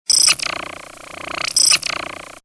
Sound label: scratch; MOBICLIC; MOBI JINGLES